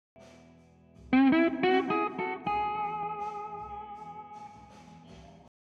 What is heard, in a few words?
guit solo and funk, fill